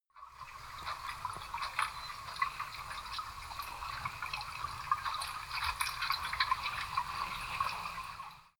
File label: canal